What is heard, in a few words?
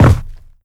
land, footsteps, player, carpet